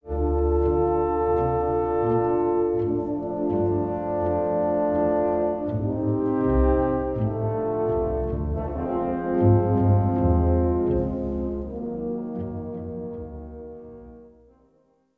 music flourish, intro

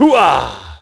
hoohah; Splashdown; XBOX

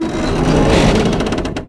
metal creak large

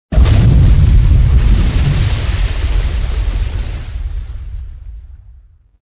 explosion water; weapons; Soldier Of Fortune II Double Helix; XBOX; explosions

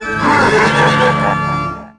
statue; norleans

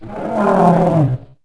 Steg call; Dino

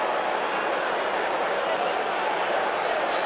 crowd; MX Unleashed

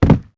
jump Land Carpet; characters

